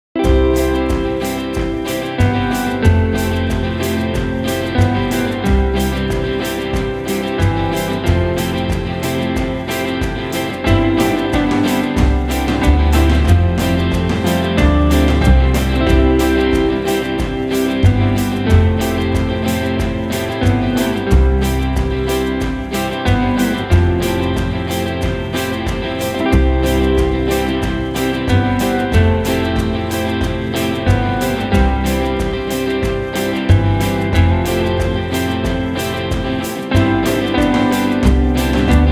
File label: instrumental